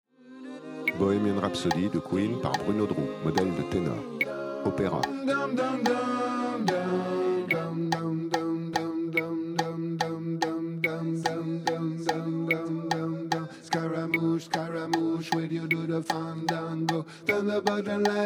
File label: Tenor Opera